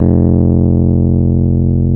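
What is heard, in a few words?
E PNO F0; Synclavier Sampler Library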